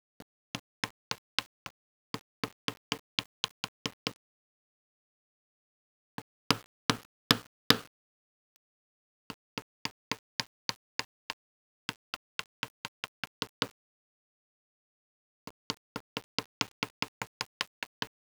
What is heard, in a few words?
lil hammer; toyshop